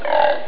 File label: pig; Amiga Soundtracker sample